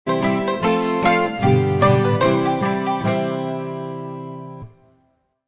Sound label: France Music Short; Civilization Revolution